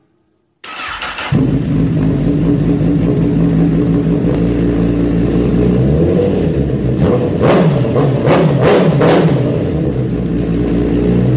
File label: Honda CBR1000RR